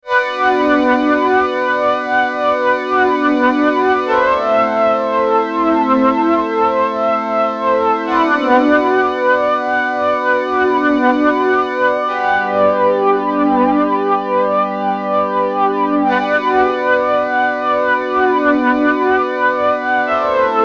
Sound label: Moog MF Flange Classic Flanger